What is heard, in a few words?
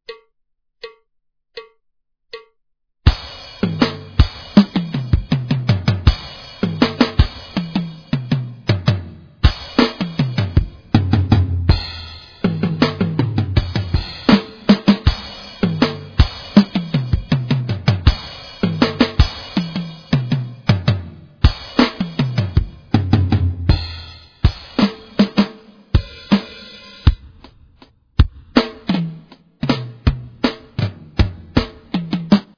Drumset; Steps Ahead In Drumset